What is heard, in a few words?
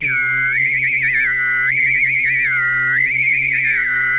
WHISTLIZR, HUMAN SYN; ILIO; Vocal Planet VOL-3; Jazz & FX